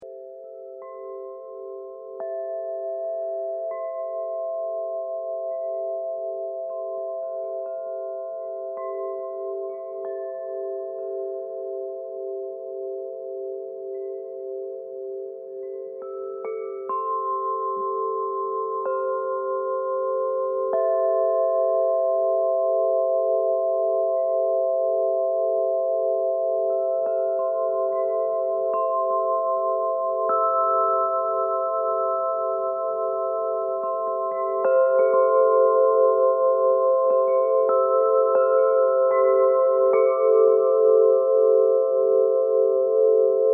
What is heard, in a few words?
Tuned Meditation Chimes Harmonic Pulsing